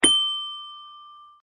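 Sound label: xylophone; Toy Story 3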